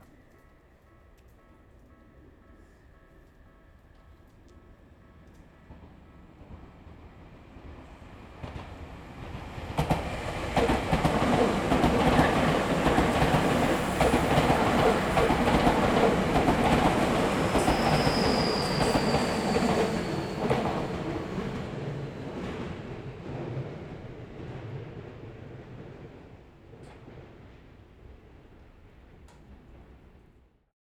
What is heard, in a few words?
train